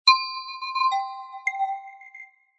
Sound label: Reflection, notifications; HTC Magic